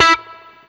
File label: BLU POP, Guitar & Bass; 90 s Sample CDs; Quantum Leap - Guitar & Bass